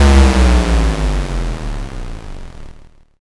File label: explosion